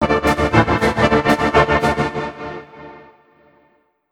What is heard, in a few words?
ORCHFX, orchestra, Asian Traditions